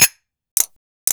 spoon klick, Cartoon Film Effects

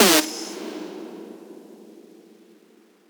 synth screech oneshot D; Synth Hits; GhostHack

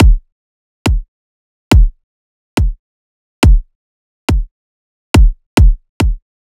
Riddim Drum Loop 140 Kick

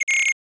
sci fi readout beep; PremiumBeat SFX

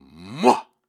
Grunts and Chants, Viking Vocal

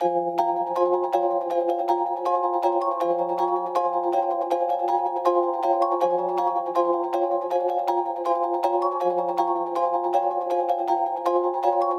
Dark Melody Loop - 160 BPM F Min; Cymatics; 2020 Melody Collection

